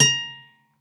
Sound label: Norm Soft A#5, Campfire Nylon Guitar